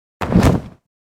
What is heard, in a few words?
sticking the landing feet hit